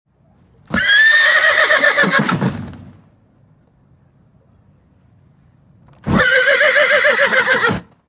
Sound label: horse whinny; wilder westen; dragon studio